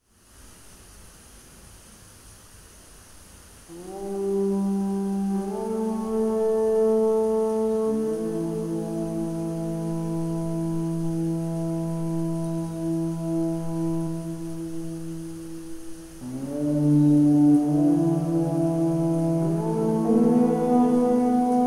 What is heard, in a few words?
Heavy Rain Drops